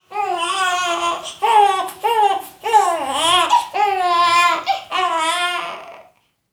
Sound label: baby crying